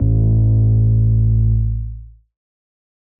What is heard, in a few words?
808s